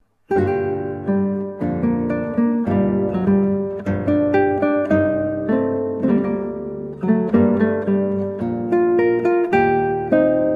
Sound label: Sonata in G Minor, Guitar; HWV580; George Frideric Handel